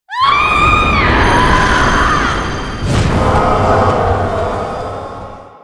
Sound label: die; Seer; GamigoNA